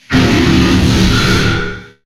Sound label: death, player